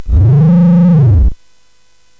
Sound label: SFX; CSG8580R5; sid; Narco Police; hvsc